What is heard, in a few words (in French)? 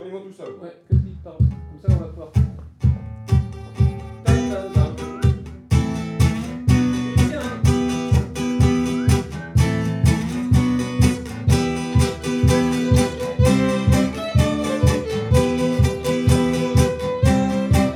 rythmique; pachpi